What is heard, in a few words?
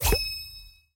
Skill Item Create; In Characters Skill Item Create